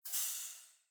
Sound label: museum door